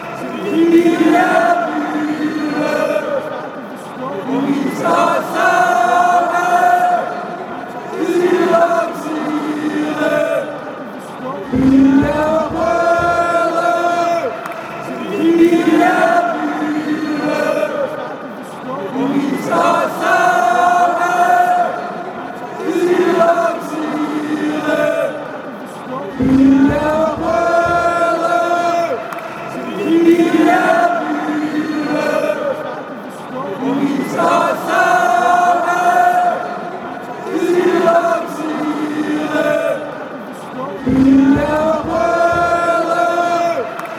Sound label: denmark chant, fanchants